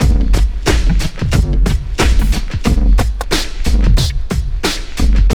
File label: TRIP 90, trip loop